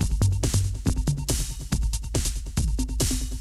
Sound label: techno 140